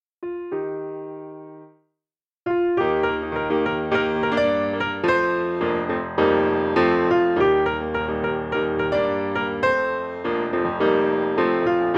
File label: FOLK Melody; Nakawa